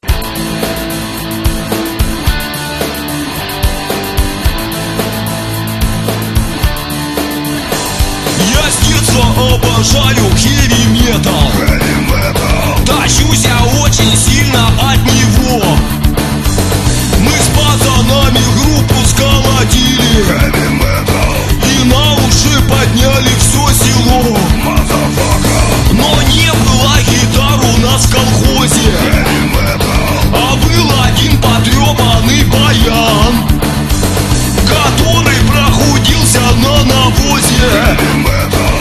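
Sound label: Hyevi metall, heavy metal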